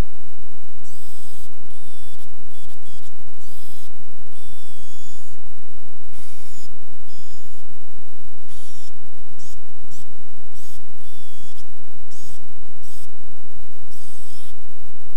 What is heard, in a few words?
drone